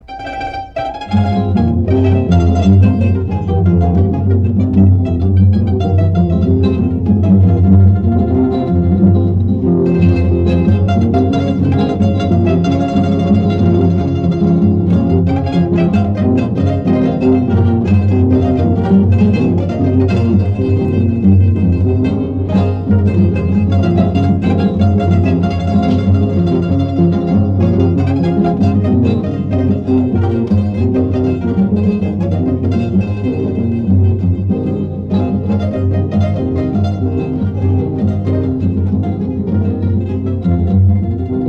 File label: guitar